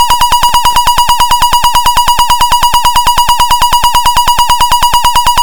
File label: pulse sync